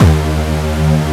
Aud Synth D#1; Digital Sound Factory Xtreme Lead

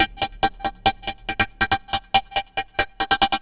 Arp 140bpm C, Trance; Arps; Zero-G Trance Inducer; 90 s Sample CDs

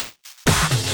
Fills 128BPM, Clubsounds

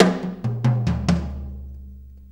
6-8 Tom Roll, Perc Loops; Drum Tools

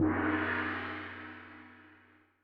Gong; Yamaha DTXpress-II; Perc

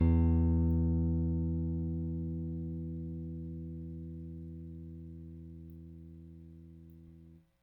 Rick Thumb A, Rickenbacker Bass; Airwindows

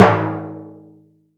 tympani; Greytsounds VOL-1; ORCH PERC 1

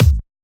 D&B Kick; neuro

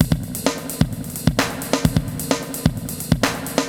Spring Verb HR-16B (130BPM), cyborg beats; musicradar

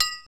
METAL, PRC METAL & WOOD; Roland - LA Composer vol. 1

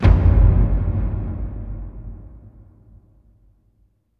BIG BOOM, Impacts, Hits & Booms; Distored Reality 1&2

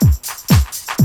125 Loop

